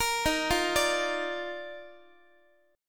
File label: D sharp Major 7th Suspended 2nd, Guitar; arpeggiated; JGuitar